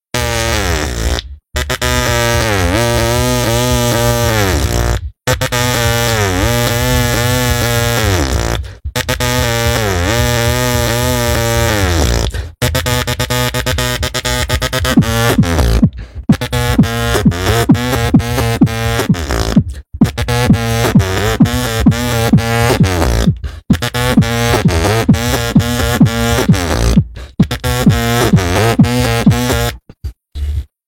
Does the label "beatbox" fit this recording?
Yes